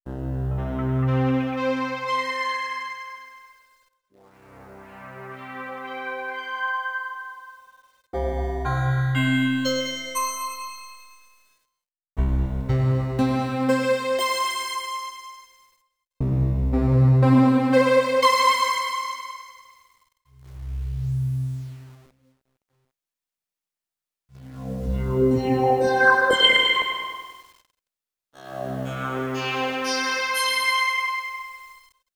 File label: Synth Effect